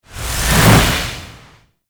Slide Swing; Warframe; Weapons; Duviri; Incarnon Adapters; Melee

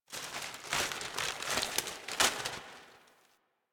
Paper Blowing D; Warframe; Ambience; Grineer; Carnival

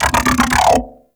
Mech Ambient Vocal; Nechro Mech; Vehicles

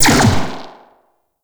Drone Fire; Super Drone Attack Osprey

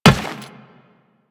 Run Metal, Footsteps; Warframe; Teshin

